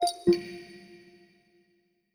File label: Speech Off; Windows Vista Beta 2, RC